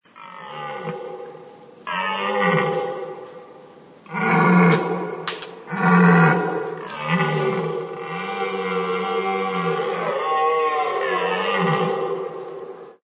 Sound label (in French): cerf